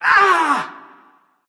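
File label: non verbal ouch, voice; cernunnos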